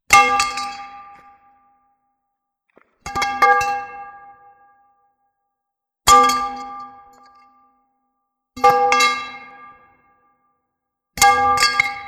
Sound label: Bowl Hitting Ground; Feed The Beast